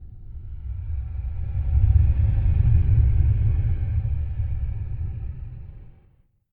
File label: Attack; Charybdis